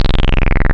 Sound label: Pulse Hi Res, wavetables; Animoog